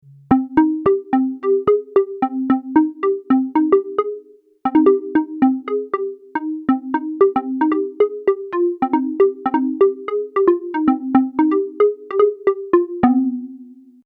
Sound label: plucked keys